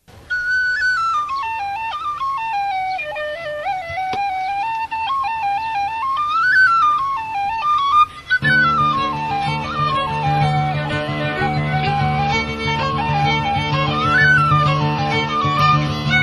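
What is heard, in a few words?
fiddle, mando, whistle; Banish Misfortune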